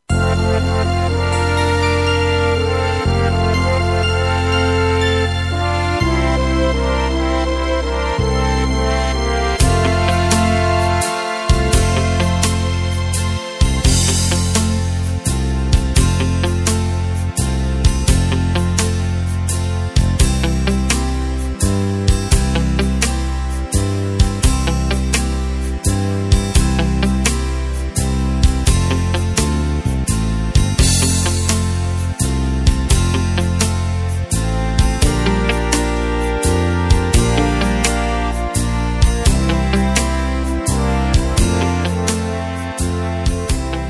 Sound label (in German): Trom Playback; midifile